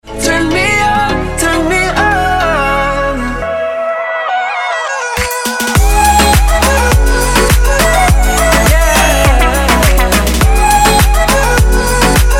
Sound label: BEAT